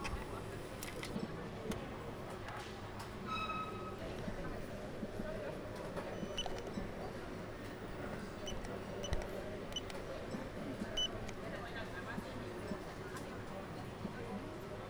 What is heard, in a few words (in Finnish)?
raha automaatti pankkikortilla kehno